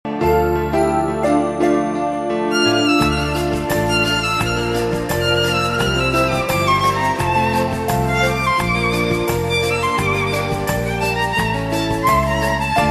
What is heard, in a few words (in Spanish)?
corazon instrumental